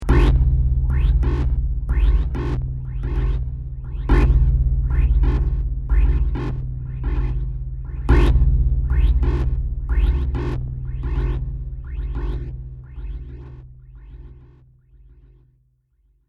Science fiction futuristic control panel random sounds; Control Panels; Sc-Fi Control Panel